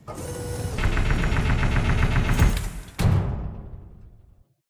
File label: raising floor break; Poppy Playtime